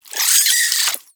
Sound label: Vocal Death, Hunting Critters; Nexifera